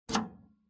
PC Hardware USB Unplug

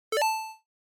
GB Racing Item

